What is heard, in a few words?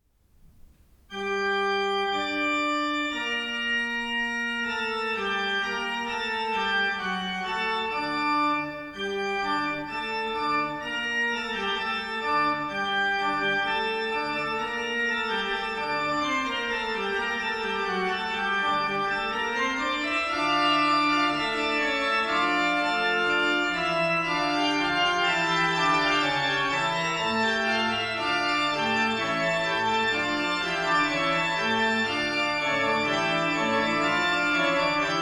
organ sound